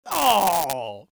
Ooooh Reactions Male; People

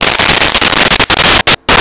pod airbrakes off

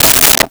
Wood 2x4

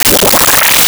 Arcade Power Up; Video Game Sounds; 1001 Sound Effects

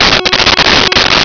klaxon loop

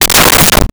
Handle Microphone; 1001 Sound Effects

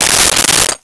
Silverware Pulled from Drawer

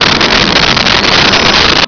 sfx pod ani shift

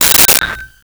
Glasses Hit and Ring; Crashes

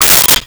Saucers Stacking, Kitchen

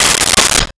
Plates Stacking, Kitchen

